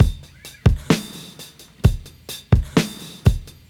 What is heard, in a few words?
Drum loops, Boombap